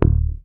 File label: HOFNER MUTE, Bass